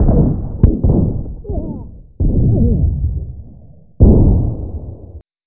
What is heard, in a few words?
distant fighting